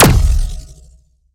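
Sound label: Sci weapon Shot Medium Blaster Pulse Zapper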